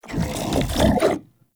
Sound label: Infested Shotgun Idle; Weapons